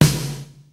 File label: kicks snare, kid; Kid Nepro; Elite; KICKS SNARES